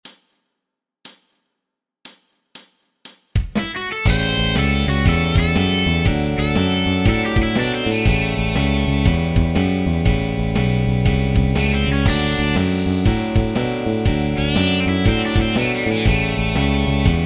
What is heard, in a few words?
Blues Practice solo, guitar solo; guitar solo tab; musescore